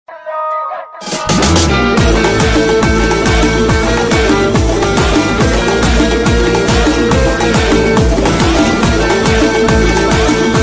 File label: climax bgm; dj tillu 2